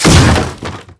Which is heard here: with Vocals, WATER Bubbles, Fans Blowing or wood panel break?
wood panel break